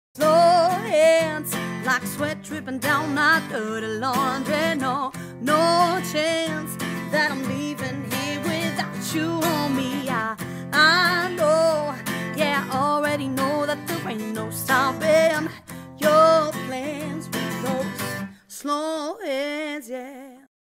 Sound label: Acoustic Duo Cover